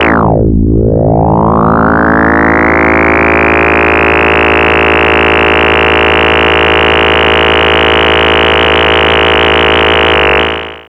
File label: FX SWEEP, SYNTHSWEEPS